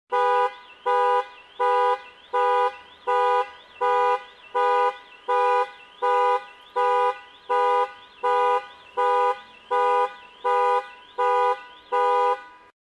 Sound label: car alarm